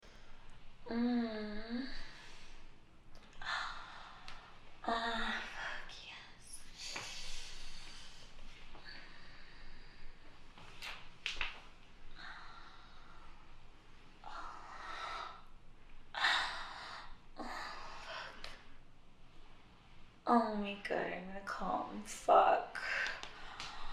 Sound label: moan; aunt